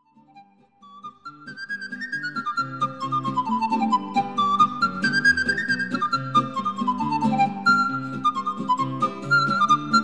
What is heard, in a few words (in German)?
Gitarre